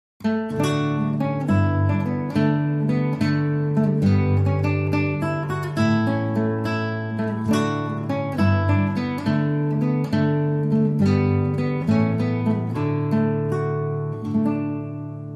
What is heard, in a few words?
Solo Guitar